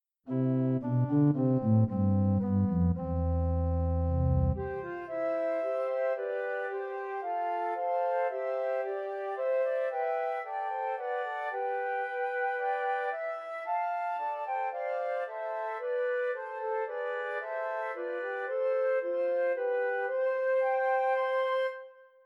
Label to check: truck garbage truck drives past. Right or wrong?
Wrong